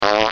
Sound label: fart; Oddworld